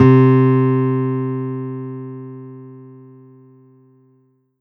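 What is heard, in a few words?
Korg 01W Classic Guitar C3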